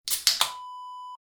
beer can open

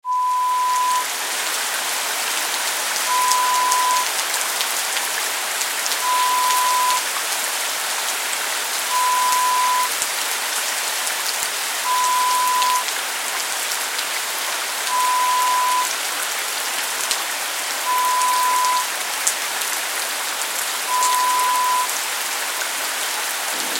Heavy rain sound

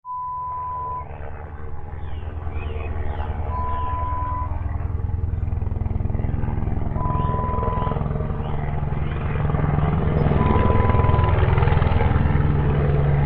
Passing Helicopter

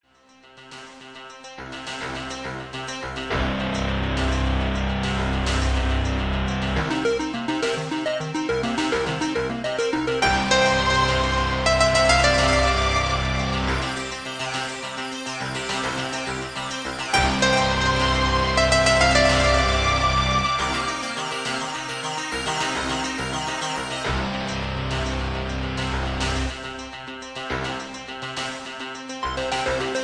TECHNO SAMP

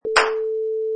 Clap

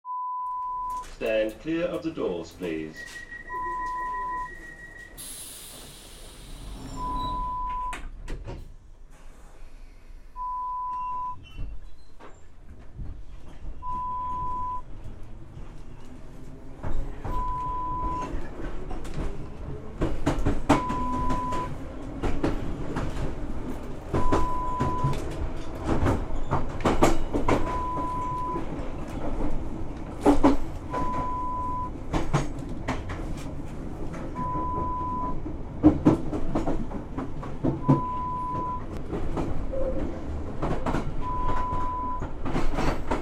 subway train inside sound

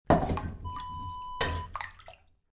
sauna water bucket

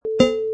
Pong

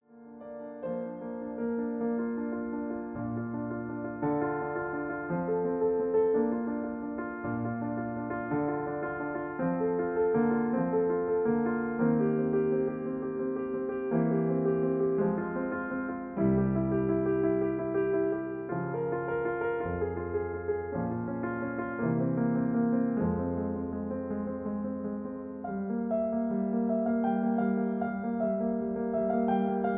Accompaniment Bflat

